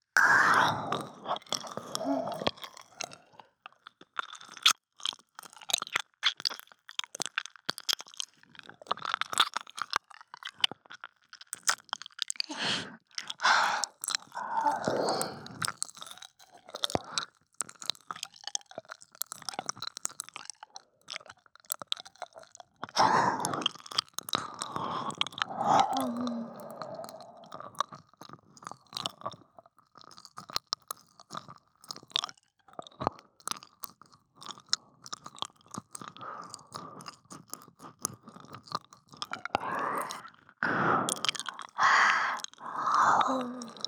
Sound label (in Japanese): 耳舐め; 超耳舐め